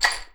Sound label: PUSHED, household; AKAI Anime Sound FX